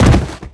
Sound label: body fall wood